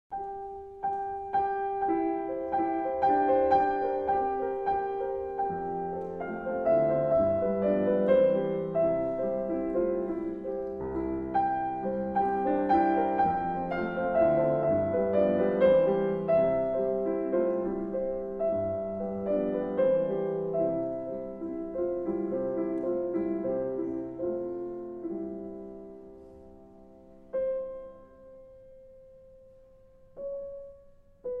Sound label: Ballade No 4 in F Minor